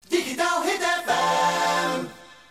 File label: Jingle (Accapella); Digitaal Hit FM